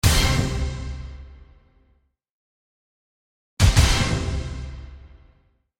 Orchestral Stabs, 2 x Classic 80's orchestral stabs.; Miscellaneous Musical; Sound Effects:Musical